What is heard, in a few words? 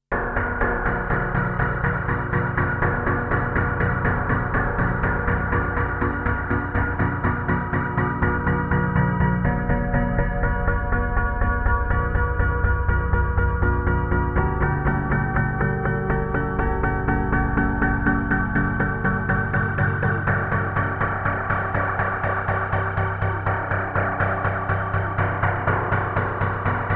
Cobalt8 Pluto Arp